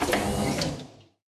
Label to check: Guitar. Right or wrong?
Wrong